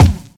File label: trebly kick one shot f key